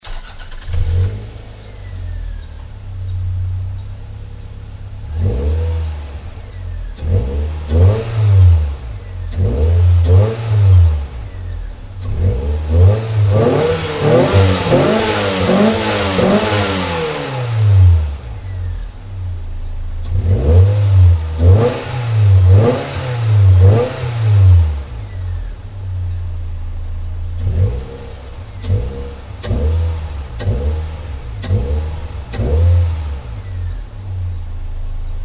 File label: sw20, muffler; car; hks